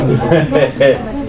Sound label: hehehe